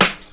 90s natural hip hop acoustic snare g key

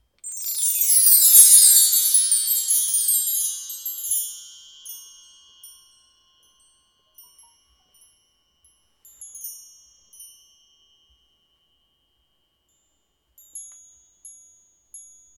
recorded studio chimes

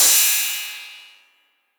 drum crash f sharp key, tr 808 crash cymbal

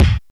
clean kick drum single shot d key

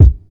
rich low end kickdrum c key; Duration 0:01; Mono

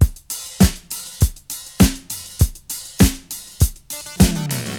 100 bpm high quality breakbeat d sharp key; Samplerate 44100 Hz

